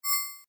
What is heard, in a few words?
Rollover, Short; Mouseovers; Multimedia; Mouseover Sound Effects; Stuart Duffield